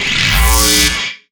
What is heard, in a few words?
zap